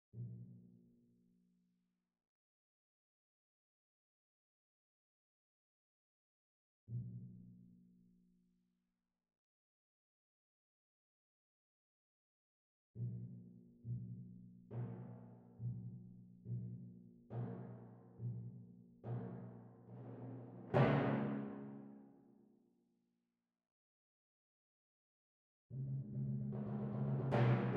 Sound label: Timpani